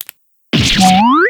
pen stab; sorin

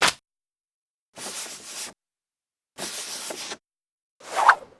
fan toss; uendo

